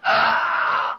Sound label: wraith dying; minecraft; technic